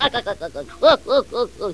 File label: pop laff; Rosegarden